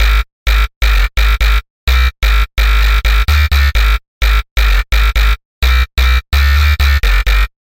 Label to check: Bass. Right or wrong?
Right